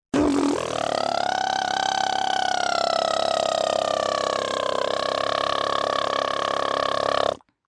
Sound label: Lips Pbbb, Mouth effects, 1 child, lips vibrating, long, human voice, kid